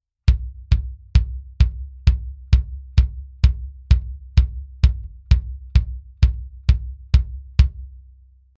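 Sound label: bass drum Mic Test RE27ND solo dry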